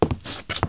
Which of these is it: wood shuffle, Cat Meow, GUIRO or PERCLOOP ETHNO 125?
wood shuffle